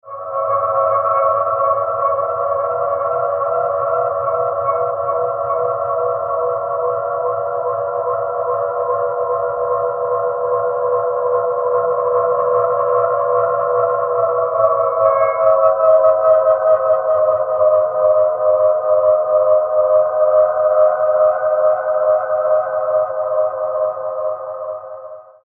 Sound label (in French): dyneema non gaine 2mm 50m, aeolian